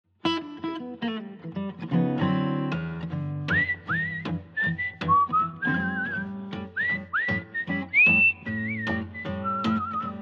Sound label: swingy vinyl mix